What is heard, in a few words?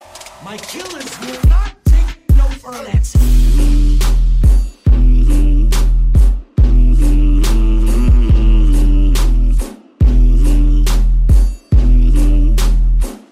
Hum Song Remix